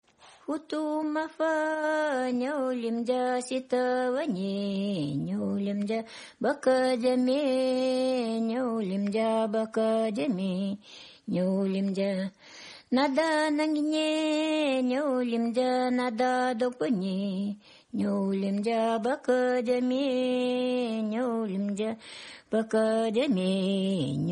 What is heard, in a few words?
tungus lullaby